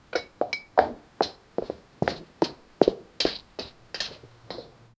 human crawling rapidly on

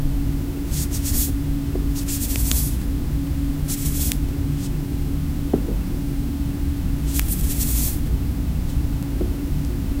softly crackling oil lamp; flutter

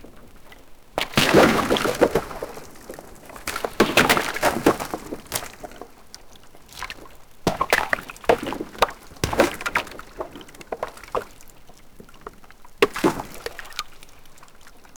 realistic splashing sound

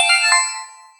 diamond discovery magical; calming

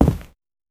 walking on concrete floor; step

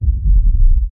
a film transition, flickering

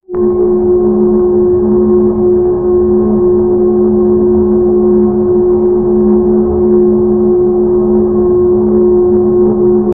you died stinger sound, robotic

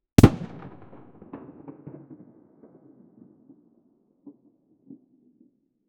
30mm autocannon

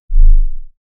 after swallowing a faint, glowing

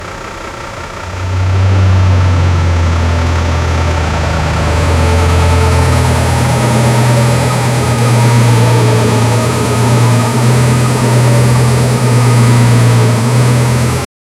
a truck engine roars to; sizzle

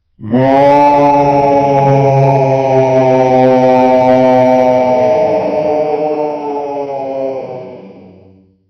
a berserker deep voiced, yell

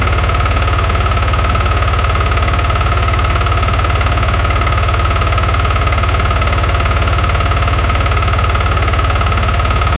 medium tank engine rpm

